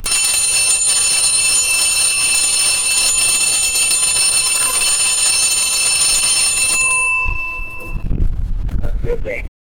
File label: school bell